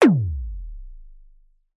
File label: impact hit sub drop punchy